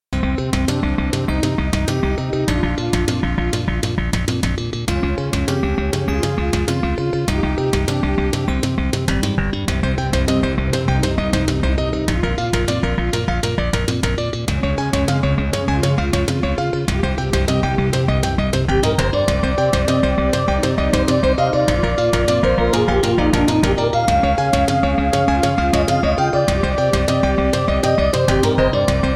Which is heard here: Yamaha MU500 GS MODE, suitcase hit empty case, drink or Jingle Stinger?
Yamaha MU500 GS MODE